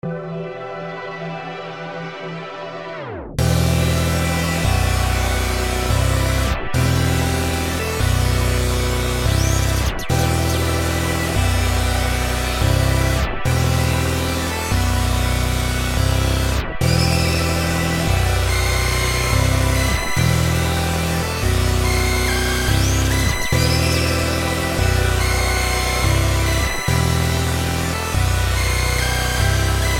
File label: 143bpm E min